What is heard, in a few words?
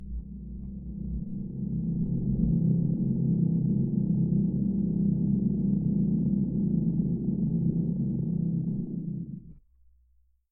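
obsessive hellish sound after death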